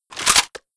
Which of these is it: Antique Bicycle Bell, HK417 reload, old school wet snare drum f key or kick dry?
HK417 reload